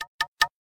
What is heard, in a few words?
Knock; GD550 Pure